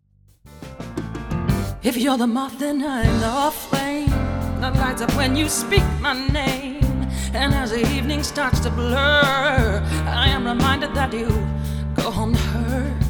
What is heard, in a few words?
Rock Funk